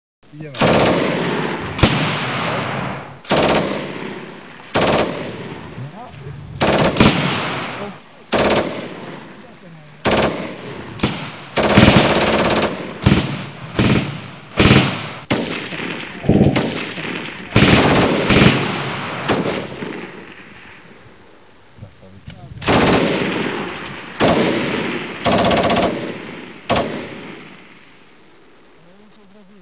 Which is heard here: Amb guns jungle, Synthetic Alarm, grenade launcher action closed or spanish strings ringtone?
Amb guns jungle